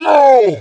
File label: hit; SWOOP GANG; Star Wars Knights Of The Old Republic I; XBOX